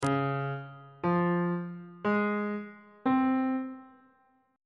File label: f sharp lydian 7th chord 5 is c sharp major 7th